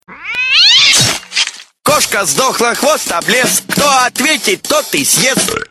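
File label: children's counting rhyme; Koshka sdokhla khvost oblez!; detskaya schitalochka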